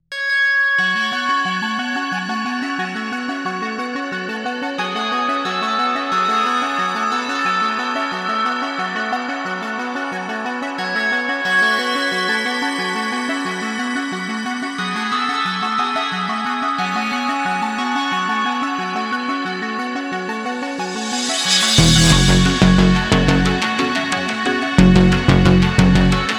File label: remix instrumental